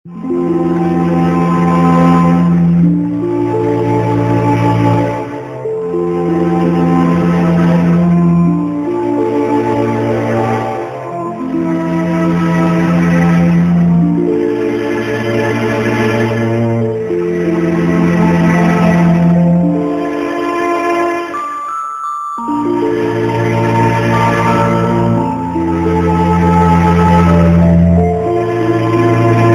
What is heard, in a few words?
orchestra beep beep repeat